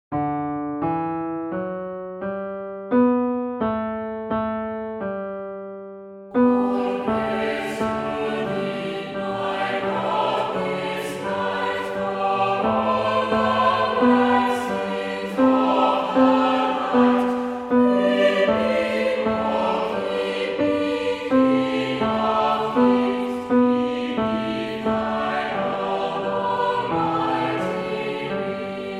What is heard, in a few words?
tenor; All Praise to Thee